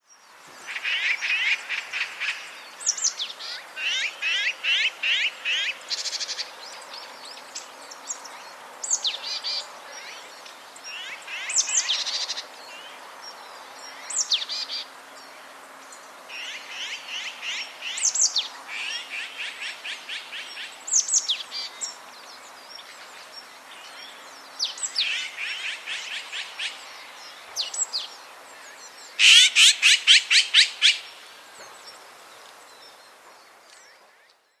song call Azure-winged Magpie Cyanopica cyanus cyanus; オナガ